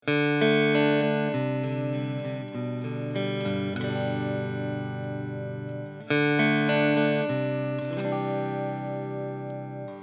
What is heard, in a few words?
IT 11 Deluxe Clean Stratocaster